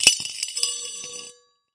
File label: Coffee Pot Rattle; ModeAudio Found Percussion Hits